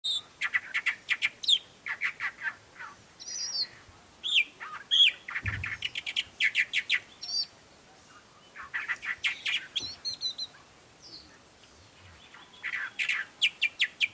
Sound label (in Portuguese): Canto do mimao de cauda longa